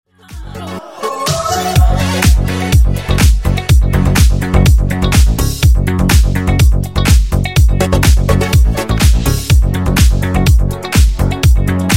Funk Bootleg 124 Bpm

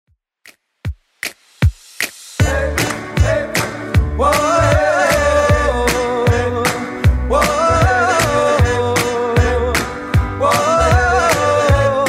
60s Redrum 155bpm

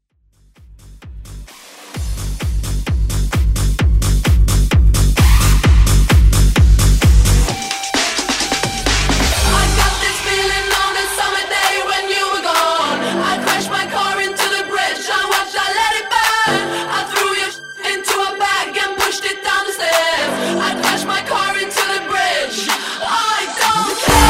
Festival Mash Up

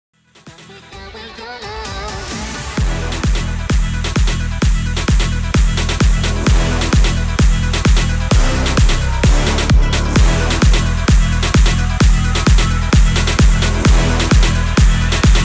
130 BPM

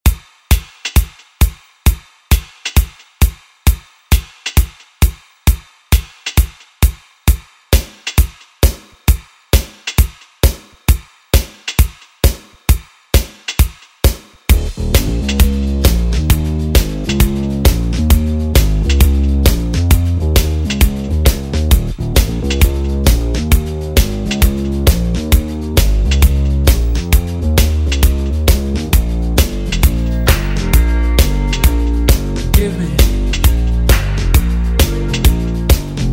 80s Re Drum; DJ Mhark

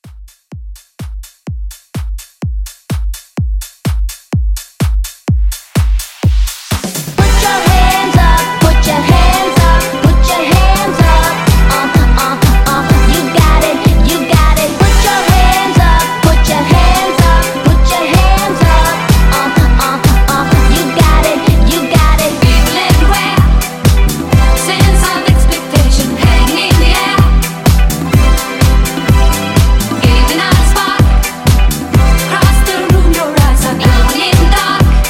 70s Disco Hype Re Drum

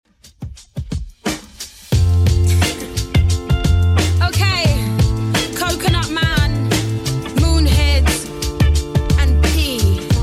2ks Redrum 88bpm; remixplanet

